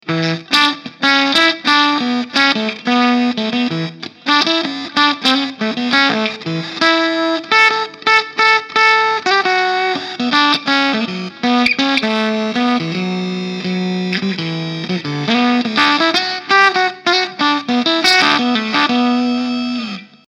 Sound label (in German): dirty mode mit fuzz